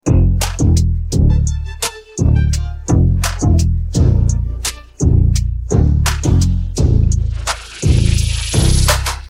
no vocals

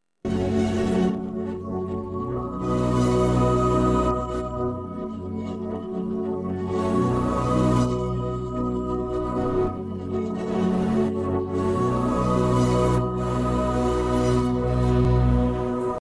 sad no vocals

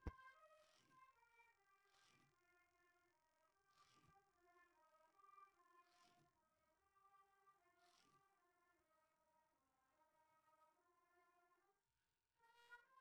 vocals

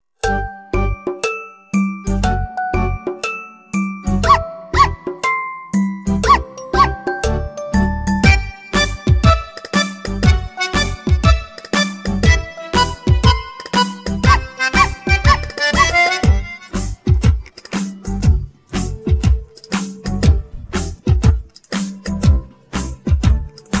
Tamil Rhymes for Kids no vocals; Dho Dho Naikutti

